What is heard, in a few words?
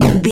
retro impact hit general